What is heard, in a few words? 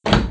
door open; the dungeon puzzlers lament